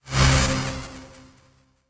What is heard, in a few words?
Aggressive Transition